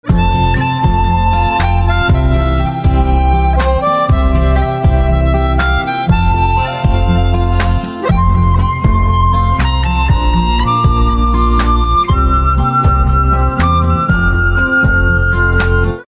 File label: Harmonica